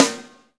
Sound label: HI PIC RM, Drum Mashines, SR-16 DRUMS; EdgeSounds